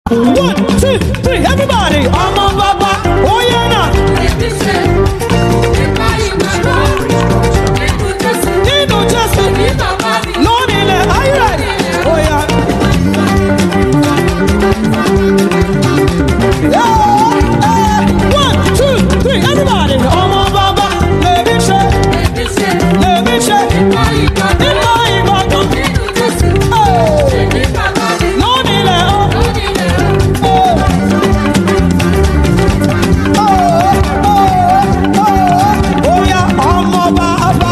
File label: LIVE AT CELEBRATION CHURCH; YABA